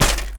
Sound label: bike land; Golden Crayon Sounds